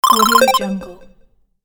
fun gaming app health bonus

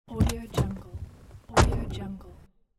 car door opening and closing

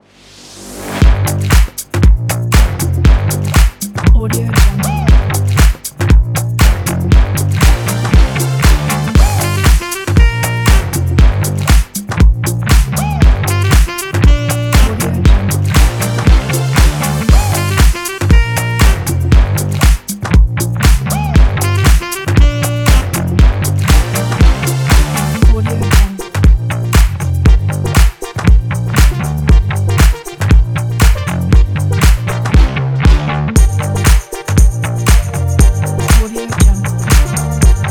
bass funky house

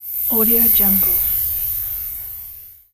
energy particles woosh

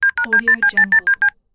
touchtone phone dialing fast

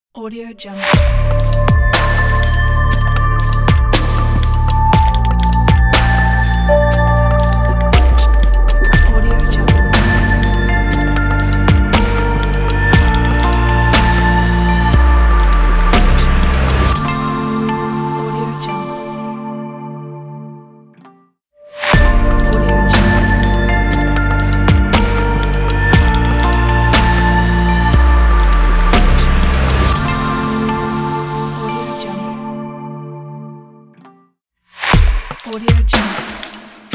abstract elegant intro logo

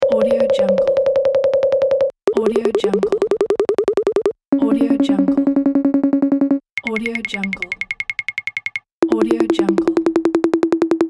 game points counter pack

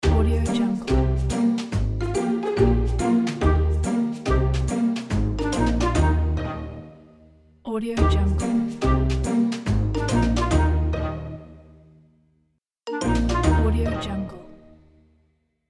happy logo

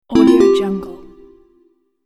considerate synth open

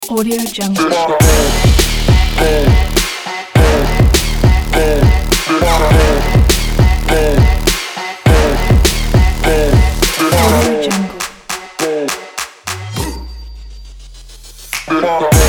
trailer trap for sport pack

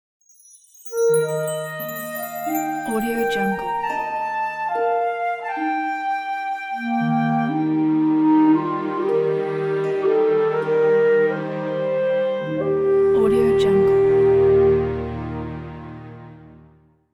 morning light happy cinematic ident; oryx

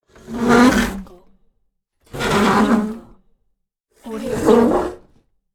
chair scraping floor; sounddivision

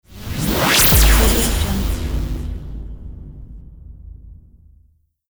radio image impact; alenalt

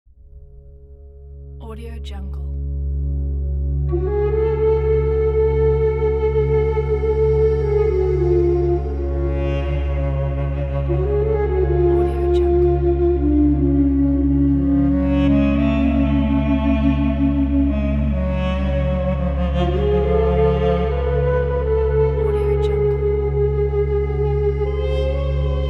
arabic ethnic

